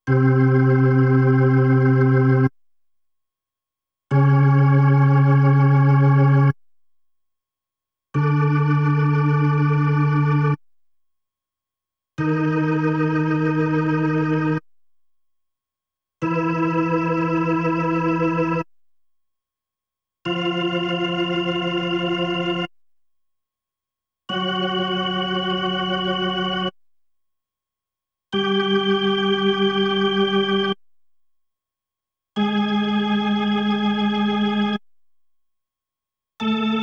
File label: B3 Organ; Masterbits CLIMAX 8